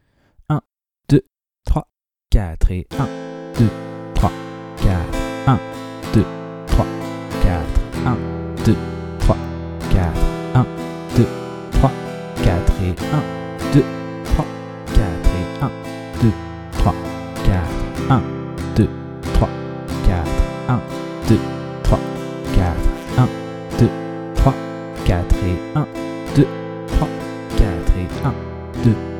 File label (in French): 1 moyen La rythmique rapide avec la première grille; Travailler la rythmique rapide avec la première grille d’accords : Am – Em – Am – C